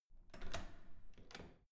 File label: security door open soft